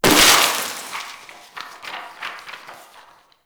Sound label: Desktop Book Destroy; Warframe; Ambience; Entrati